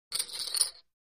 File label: Poker Chips Drop, casino games chip mvmt